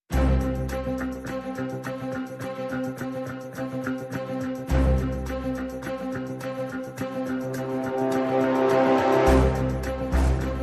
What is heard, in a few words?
With Small Perc